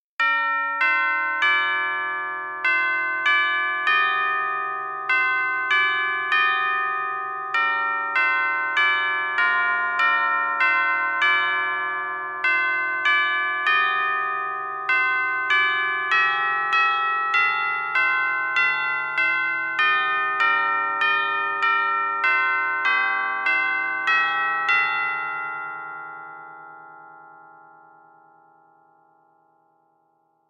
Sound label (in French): Noel Solist cloches; Jingles medley